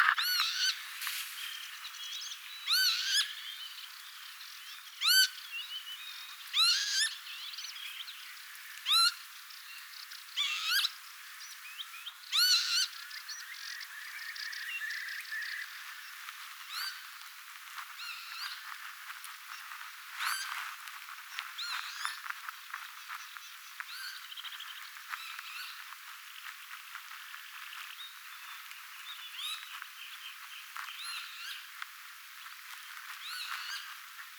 töyhtöhyyppäemon ääntelyä

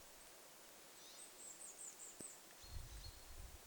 jarripeippo

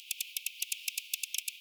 pulmussirrin aani

